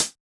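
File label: hi-hat Closed, Yamaha DJX 2B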